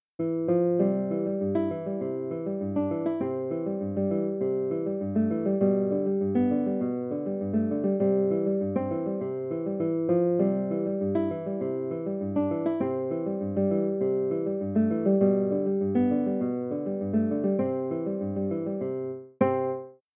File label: solo guitar